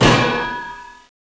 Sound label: Whack; Princess and the Pea; SoftKey